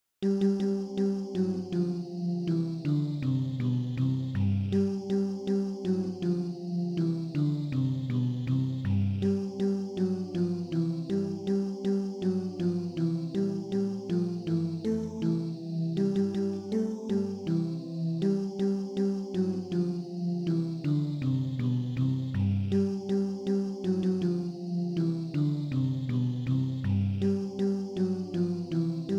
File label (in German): mittelstimme, chor; Die Gedanken sind frei